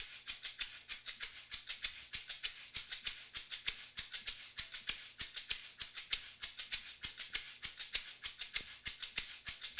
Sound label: Smooth Groove Shaker, 70s Analog Funk; Sonic Foundry (Sony Creative Software); What it Is!